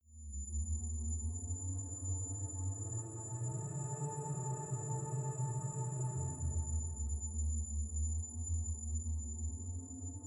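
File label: space mysterious danger, Science Fiction; atmosphaeren umwelt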